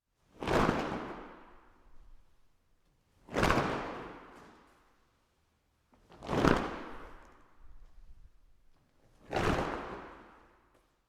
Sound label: sheet flap in large hall